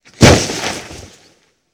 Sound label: Body Fall On Dirt, Hand To Hand Combat; Sony Pictures Sound Effects Series